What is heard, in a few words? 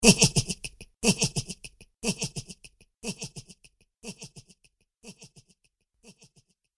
Laughing Silly Snicker With Delay; Laughter Sound Effects